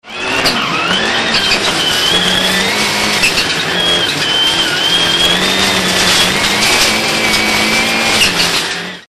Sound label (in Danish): impeller kvitter